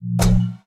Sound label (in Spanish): Shield aparece